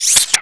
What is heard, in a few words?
spider move